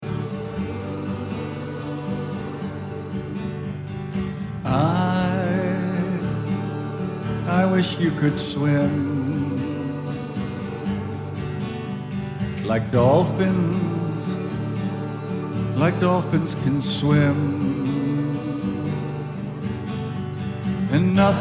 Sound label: Heroes Low Audition Track